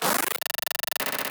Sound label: Computer short; SoundBits